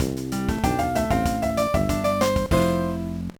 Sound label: Jingle, gba